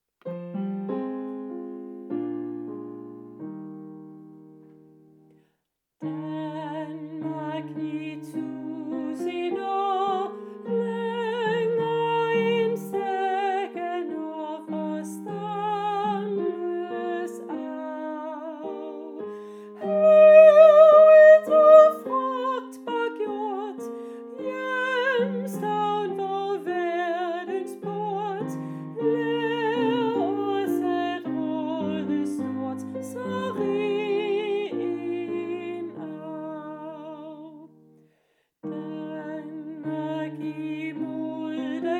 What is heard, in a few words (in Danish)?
hymne sopran